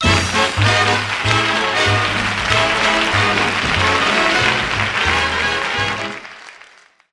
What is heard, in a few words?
Local TV Talk Show Intro with Polite Applause